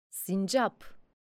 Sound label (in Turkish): Sincap